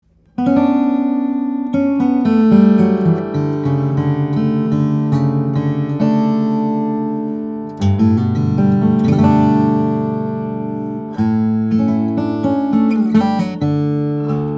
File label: McConnell 15 Inch, steel string guitars, flattop; madagascar rosewood moonspruce